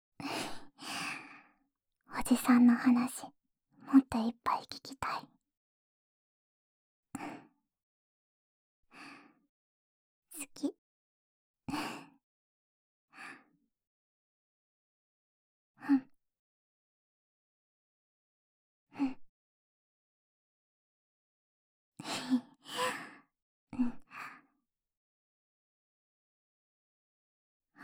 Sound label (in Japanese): うなずきループ; ASMR